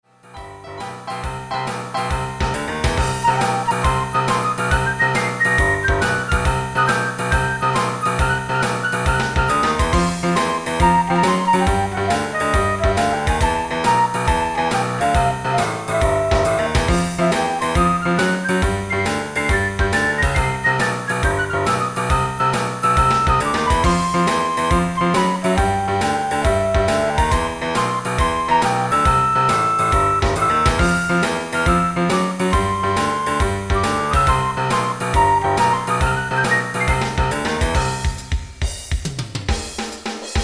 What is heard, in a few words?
evening pub